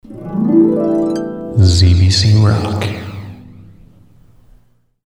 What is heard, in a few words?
harp